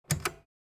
Portable Old Cassette Player, Heavy clunk of pause button; Sound Ex Machina